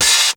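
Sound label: hi-hat lofi open; 300 Drum Machines; Yamaha Motif ES-Series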